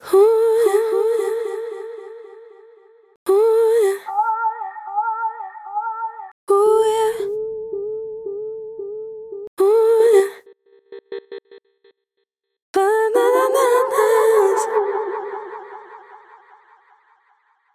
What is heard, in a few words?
aas objeq delay; Delay-Plugins